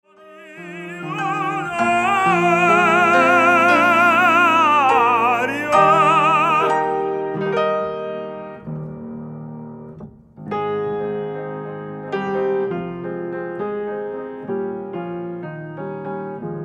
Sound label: Piano; LaBoehme